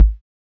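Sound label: bass drum, analog, KPR-77; Sound & Vision - Gigapack I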